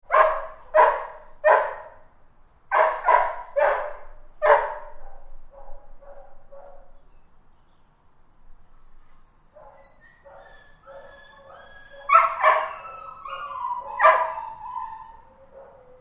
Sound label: animals dogs bark whine kennels interior; Dogs Barking Inside Kennels; McKinney Sound